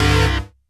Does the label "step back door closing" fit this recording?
No